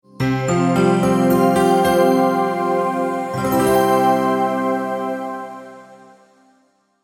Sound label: multimedia logo, Musical Identity/Signature